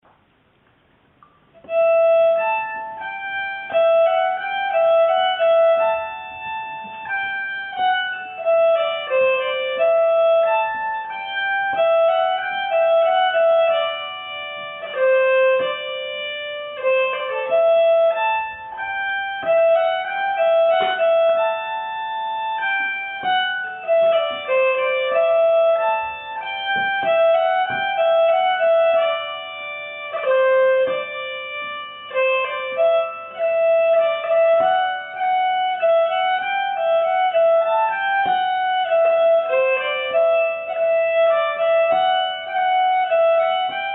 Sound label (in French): bourree Conscrits